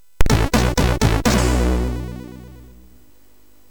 Two Jingles, MOS6581; hvsc

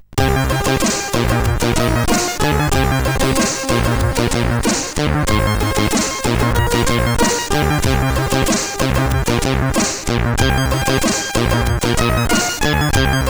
Rain Fan sid CSG8580R5

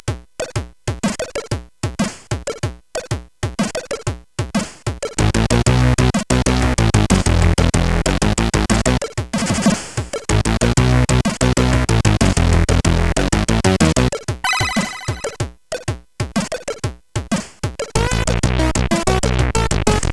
Density Cartoon sid CSG8580R5; hvsc

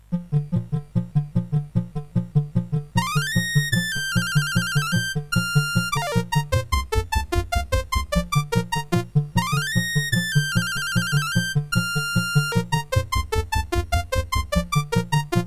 Chinese Dance, CSG8580R5

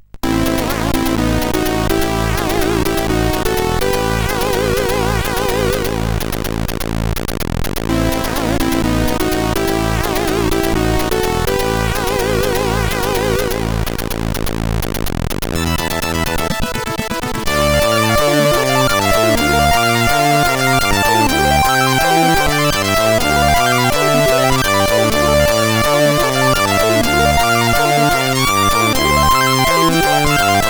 Cute Tune sid CSG8580R5

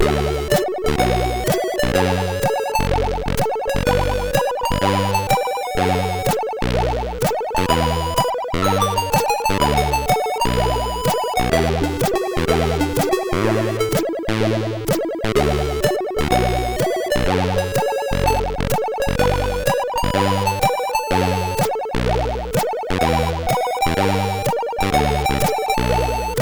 Mind Control End, sid MOS6581R3

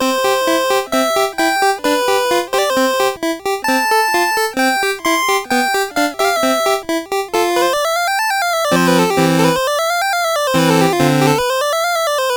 Sonata, sid MOS6581R4

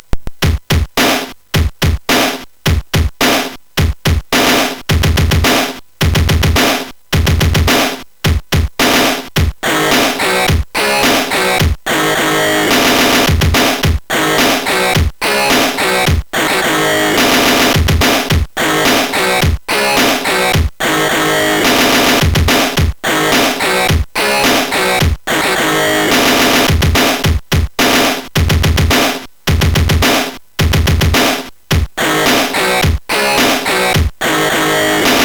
Heavy Beat, MOS6581R4

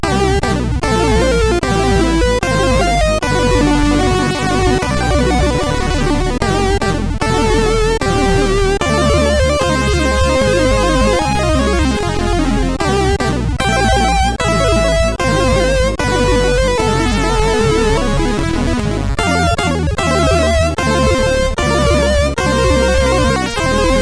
D50 tune sid CSG8580R5

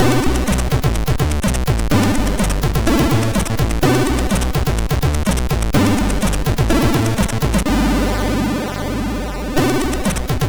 Get Ready Jingle, MOS6581R2

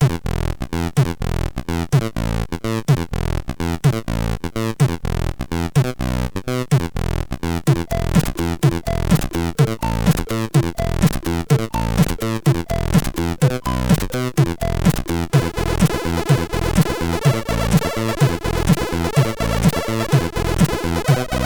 sid CSG8580; HVSC; Christy

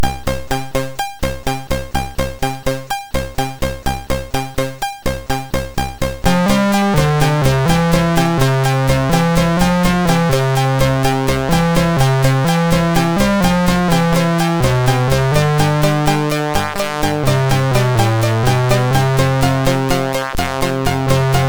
Small Tune, MOS6581R3; hvsc

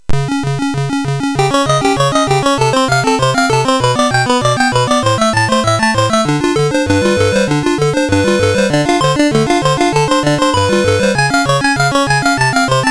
Slap Fight, MOS6581R3; hvsc

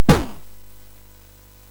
SFX, MOS6581; Narco Police; MOS6581R4; hvsc

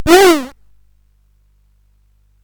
SFX; Narco Police

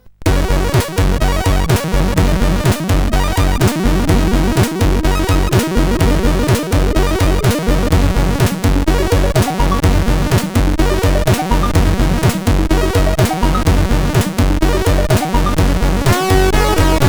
Cracking Sweet, CSG8580R5

